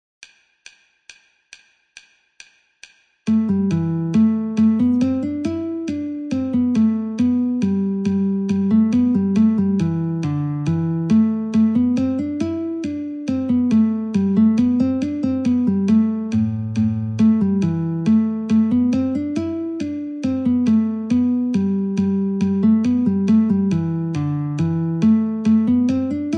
Guitar met 69

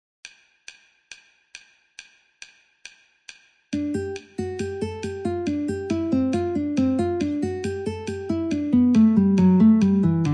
Guitar 2 in G metronome 69

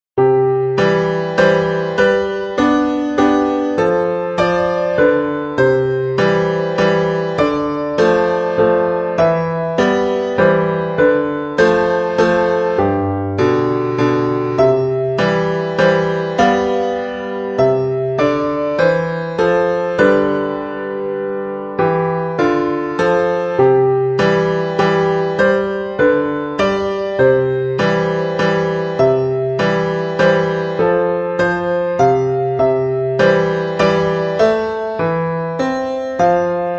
Carthage Waltz

